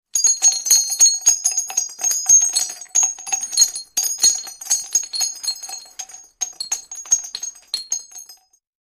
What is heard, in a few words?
Various Shell Ejects